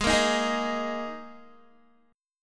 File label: Ab Suspended 2nd Guitar; strummed; Absus2; 15,13,15,13,15,x